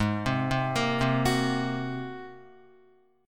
G sharp 9th G sharp Guitar; G#9 arpeggiated; 3,3,0,3,0,2; JGuitar

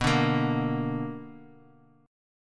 B Minor Major 7th, Bass Standard; strummed; JGuitar

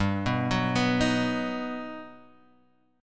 B-Major G

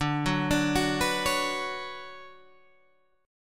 D Major 13th D down Guitar Standard; arpeggiated; capo 9

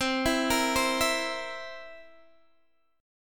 B sharp 7th, B sharp; B#7; arpeggiated

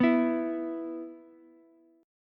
E 5th E down Ukulele; strummed; JGuitar

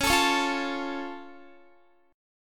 Db Augmented, down, Guitar; strummed

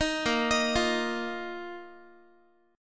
A sharp Suspended 4th Guitar; A#sus4 arpeggiated; 18,18,18,15,x,x

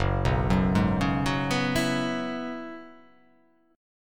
F sharp 9th Suspended 4th F sharp, chord

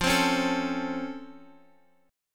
C sharp Augmented Major 7th F, guitar chord

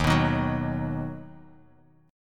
Eb Minor Sharp 5th Eb down; Ebm#5 strummed; JGuitar

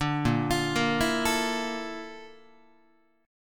Bb Major Sharp 11th Bb down Guitar, chord; arpeggiated; JGuitar

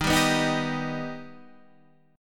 D sharp Augmented, Guitar, Standard; strummed; 11,10,9,8,8,11; JGuitar

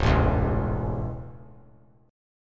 Db Minor Sharp 5th Db; strummed; Dbm#5; JGuitar

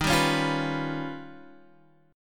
D sharp Augmented 9th D sharp Guitar DADGAD

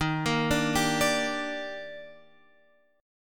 Eb Major 7th, Guitar, Open F; EbM7; arpeggiated

